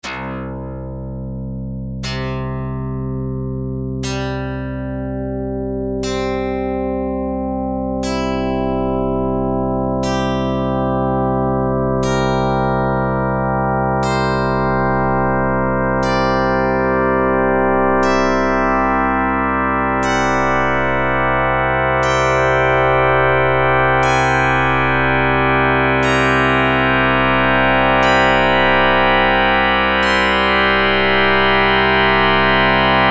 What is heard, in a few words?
overtones